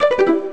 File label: Power Down; BeOS